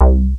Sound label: RAVER C3, BASS; Q Up Arts; Dream Experience